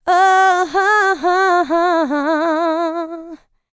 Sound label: LICKS, Vocal Planet, R&B; ILIO; Dance; LT R+B